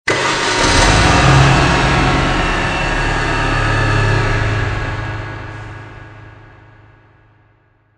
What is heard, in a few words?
sound of horror; ringtones